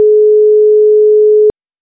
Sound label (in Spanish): llamada